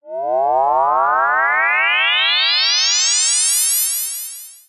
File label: science fiction retro laser slow rising